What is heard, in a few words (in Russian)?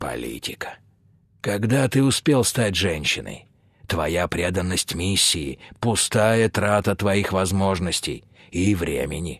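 garmon, accordion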